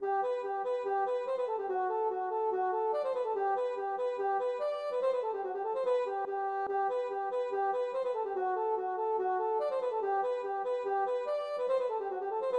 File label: polka